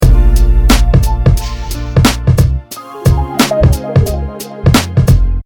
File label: Music loop